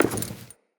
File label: Walk Wood, Footsteps; Enemy; Characters; Lady Deora